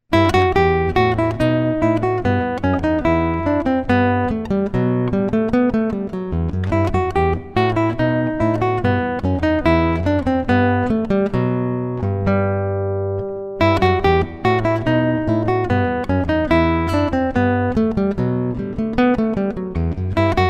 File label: Bourees